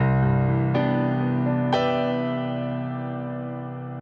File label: Mystie, jazz